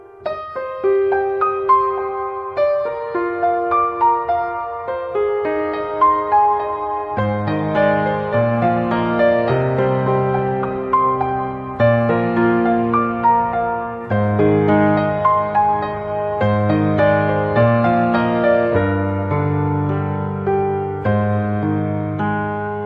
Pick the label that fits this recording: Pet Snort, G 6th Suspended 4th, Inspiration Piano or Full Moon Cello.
Inspiration Piano